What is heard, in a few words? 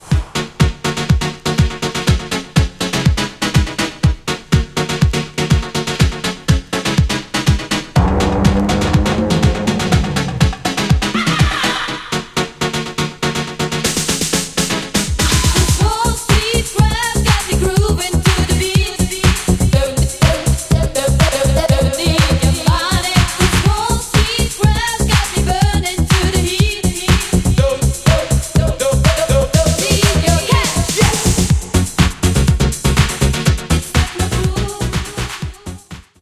Techno groove